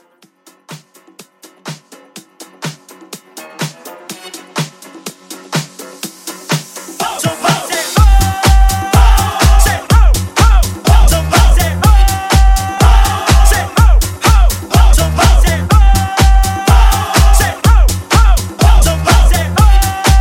Club Hype

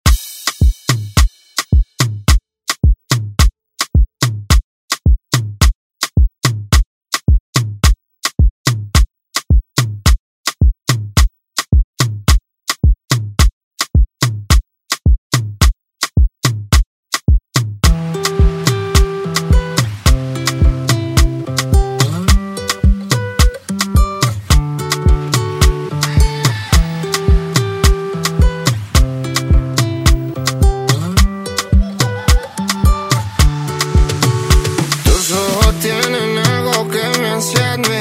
ReDrum; DJ Mhark

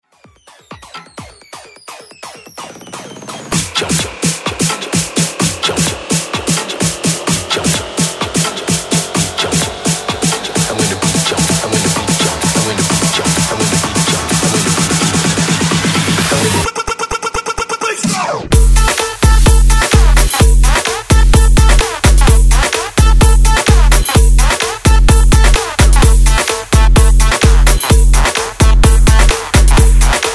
128 BREAKMIX; DJDOLLS

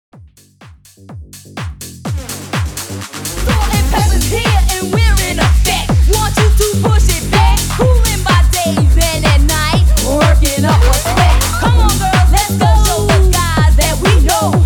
HYPE 125 BPM

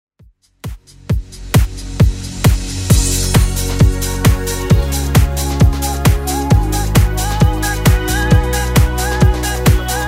90s Redrum 133bpm

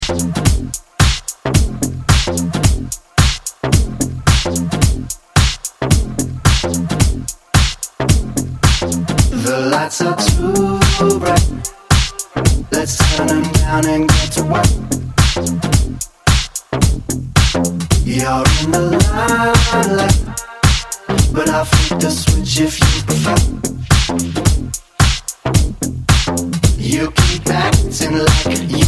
Xtend Redrum

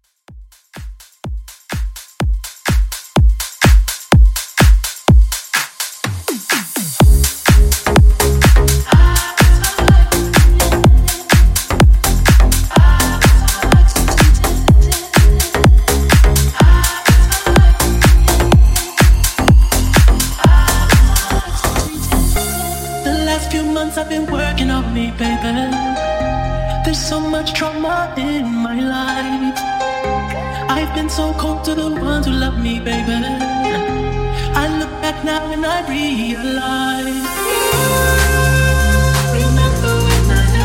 top40 slap house mashup

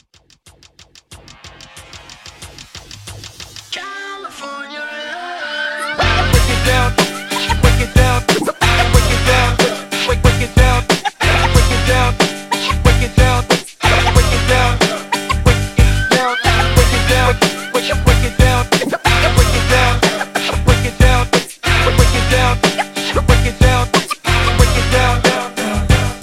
Hiphop Mashup 92

